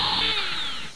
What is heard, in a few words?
tube dispenser; physioex9